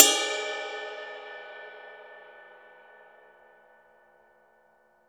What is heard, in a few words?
Drum Dry; East Collexion; Drum 1